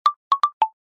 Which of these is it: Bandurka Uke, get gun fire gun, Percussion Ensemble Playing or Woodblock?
Woodblock